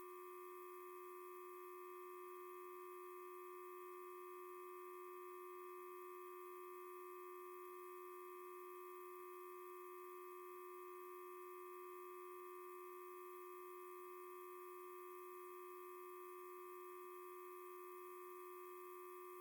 Lamp Harmonic Hum

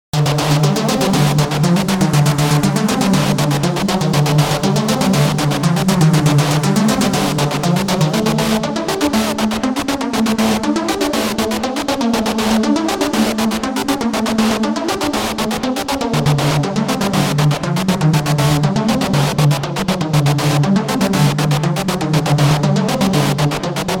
Anxious Techno Loop

